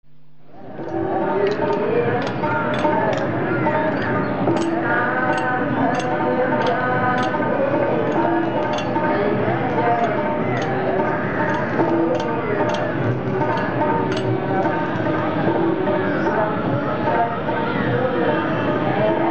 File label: Temple Song With Industrial Sounds In Laxman Jhula Rishikesh; Field Recordings From India Nepal